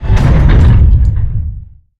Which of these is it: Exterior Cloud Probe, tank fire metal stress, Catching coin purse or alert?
tank fire metal stress